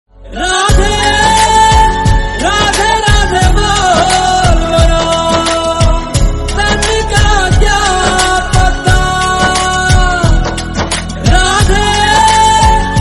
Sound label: Bhakti Song Ringtone